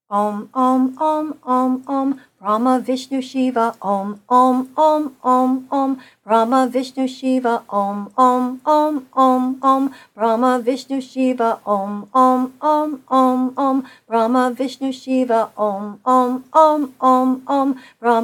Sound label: Om chant